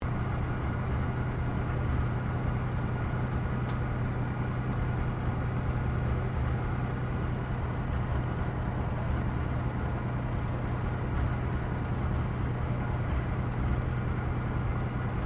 AIRCRAFT SUPERMARINE SPITFIRE MK-VIII PREFLIGHT INTERIOR; Airplanes; Airplane Sound Effects